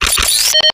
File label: quest status tick advanced